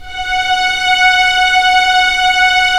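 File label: STR SC, strings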